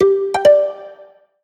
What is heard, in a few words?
Pizzicato, notifications; Honor; X9c